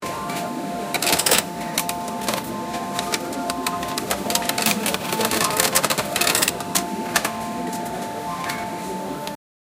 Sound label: cash register sound; selling